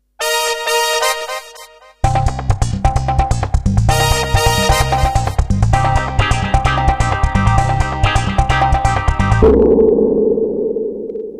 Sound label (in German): signation werbung